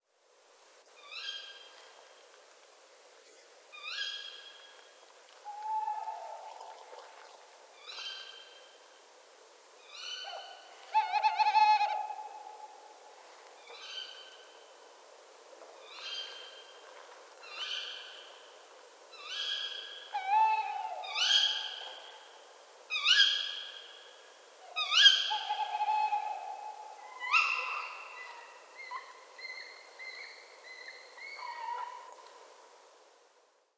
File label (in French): Chouette hulotte Couple, parc; Citeaux